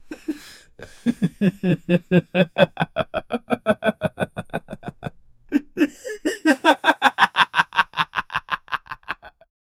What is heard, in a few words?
a evil men laugh